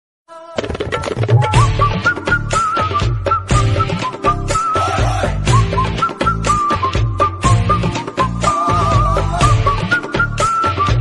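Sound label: flute background music ringtone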